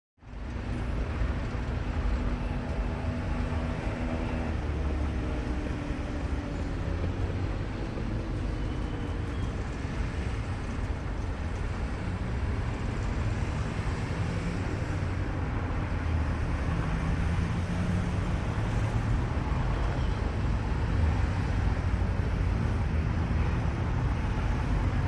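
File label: City Traffic Third World, foreign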